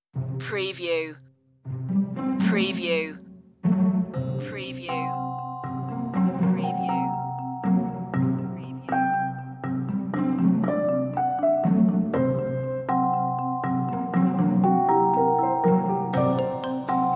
no violins

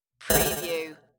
Squiggle

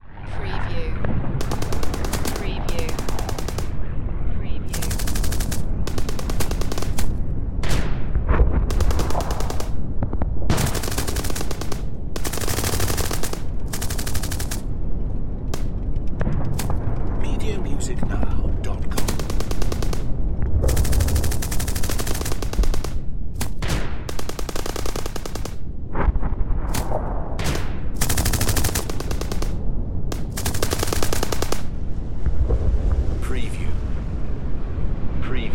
Battlefield Ambience; sound-effects-bible

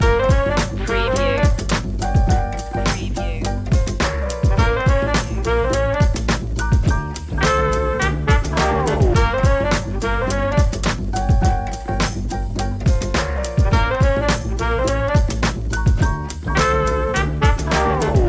comical detective loop

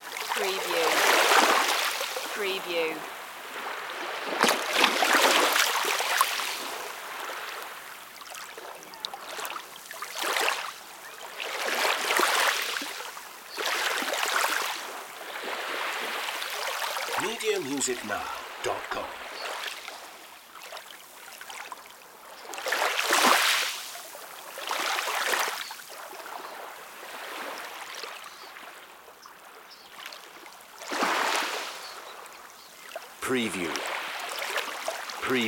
small waves splashing

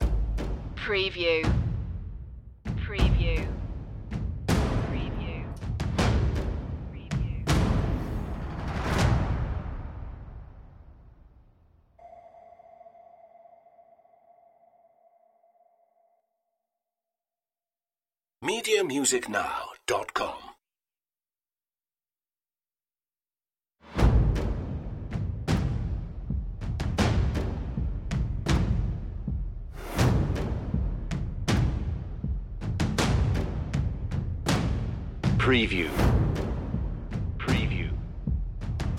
savage future perc mix

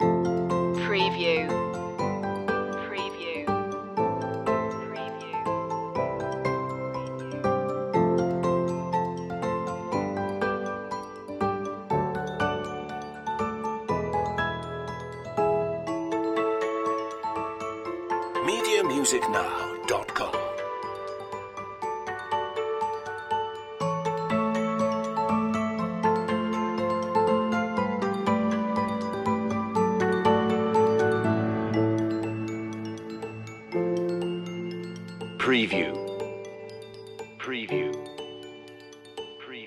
bell shoppe loop

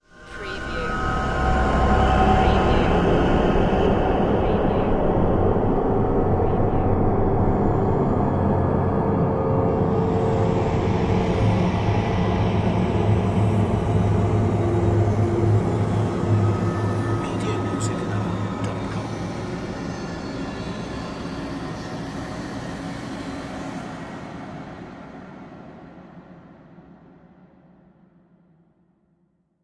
Swell Airy

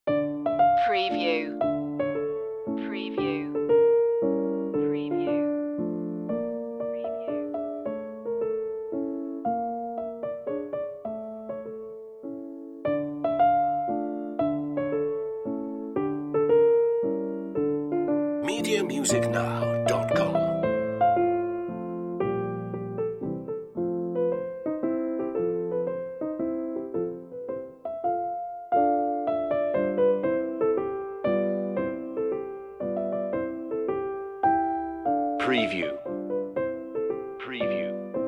mazurka piano; tchaikovsky

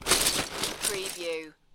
Lego, grab handful of lego from lego box

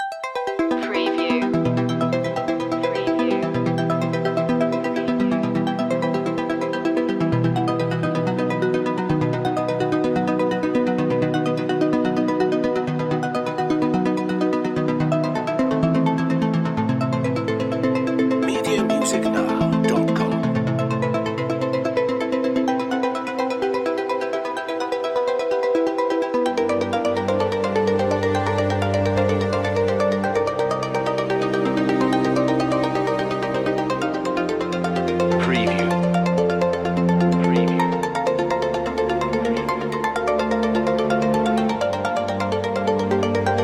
etherea no perc

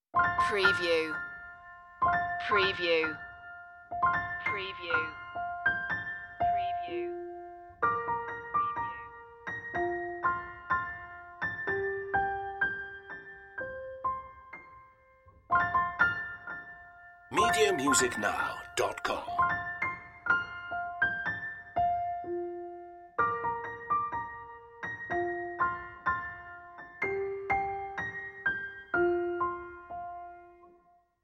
jingle bells light piano 62bpm

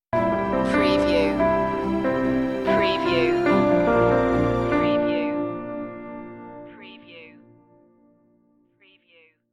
hope stinger

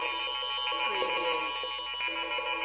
Space Scifi Sound - klax

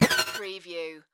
Alarm Digital Pan Whoosh

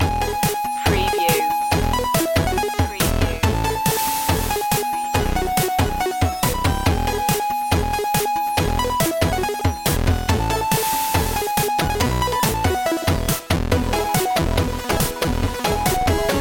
8bit jingle bells loop

